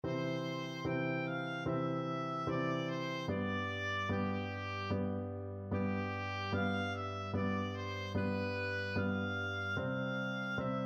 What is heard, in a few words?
vivaldi winter, oboe